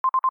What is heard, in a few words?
Alert Connecting Low Latency Haptic; iOS 26 Sounds